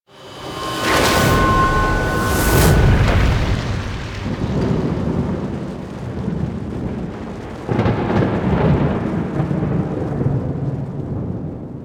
Throne Destruction Start; Ballas; Warframe; Quests; The New War

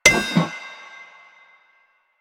Street Sign Destroy; Ambience; Props; Warframe; Nineteen Ninety Nine

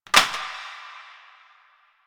Plastic Drop; Warframe; NineteenNinetyNine

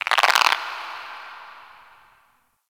Ocean Critter Frog; Warframe; Grineer Ocean

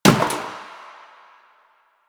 Destructible Bucket Impact; Warframe; Ambience; Nineteen Ninety Nine

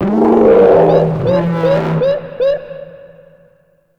Bomber Strafe Run Warning; Warframe; Eidolon; Grn Bomber

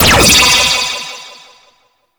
Energy Gate Hit, Laser; Space Trech Run

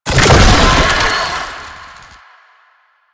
Infested Oni Roll Start; Warframe

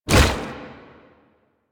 Tank Infested Arm Move Fast; Enemies; Movement; Lasrian; Warframe

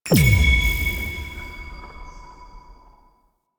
UI Intrinsics Flare; DE; Warframe; Railjack Player Ship

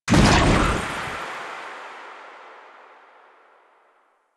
Rift Enter; Warframe; Magician

